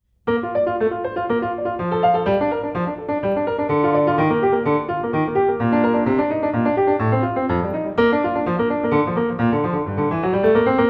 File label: Piano Solo